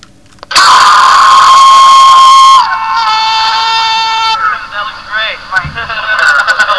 AAAAHHHH

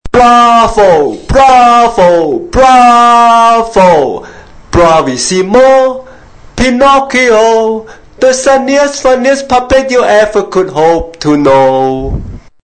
Pinocchio Reprise Puppet Tenor

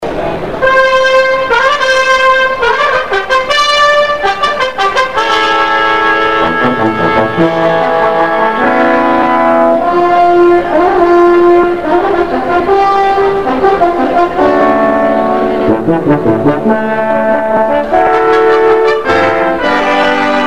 Fanfare

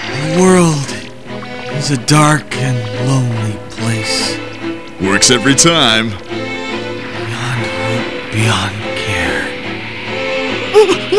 wave attack; ranma

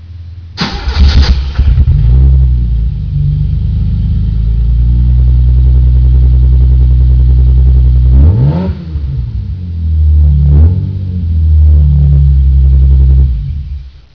startup

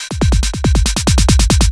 eurotrain fill 140

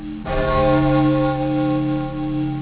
bells

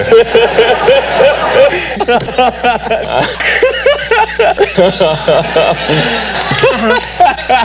laugh

